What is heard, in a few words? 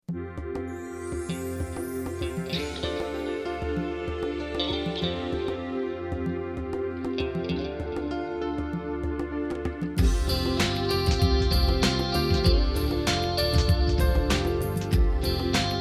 Twang Mystery